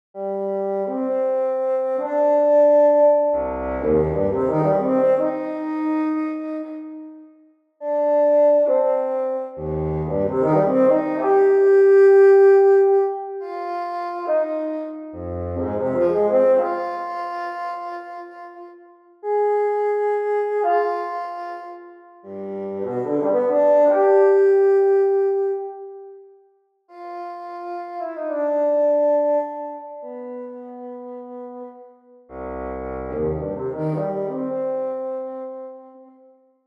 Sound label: Bassoon Legato